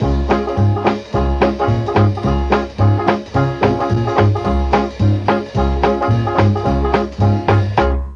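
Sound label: F2 D BONE, mellotron trombone; DIXIE+TRMBN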